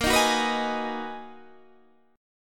B Major 13th A sharp, guitar

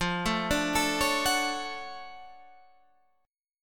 D-Major 7th F

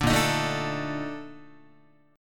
A sharp Major 11th Guitar, chord; A#M11; strummed; JGuitar

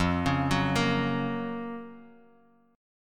Gb Major F; Gb/F arpeggiated; JGuitar

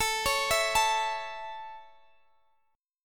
A Major, Guitar DADGAD

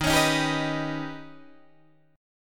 Eb Augmented 7th, Guitar, Open D

